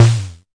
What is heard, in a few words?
Arturia MiniBrute prc